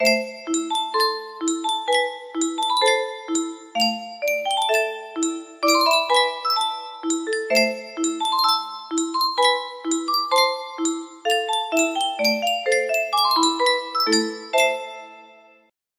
music box, music box melody

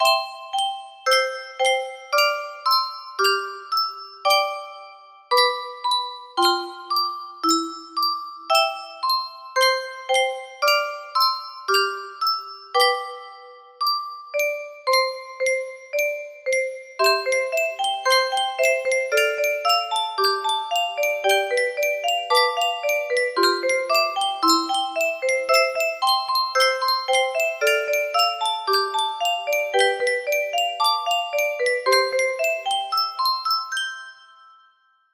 melody, music box

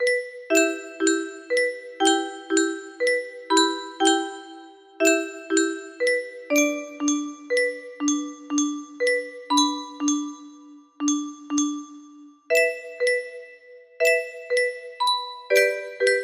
Waltz, music box melody

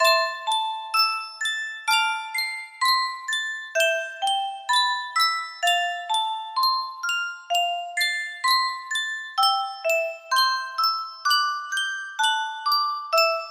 Sankyo Music Box; final fantasy iii

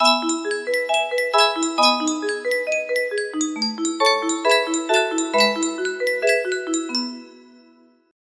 music box, music box melody; roxas

